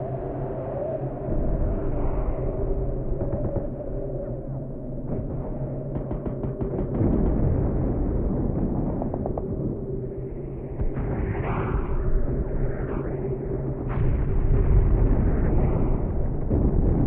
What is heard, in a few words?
Hold Arena Ext Ambience; Starfire